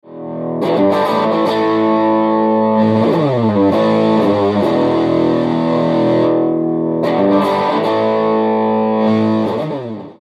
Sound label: Amp CLEAN Fuzz; Analogman